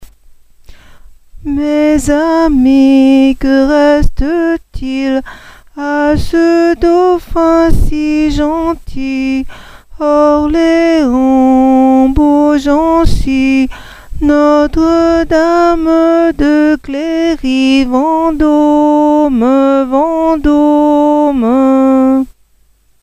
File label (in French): carillon de vendome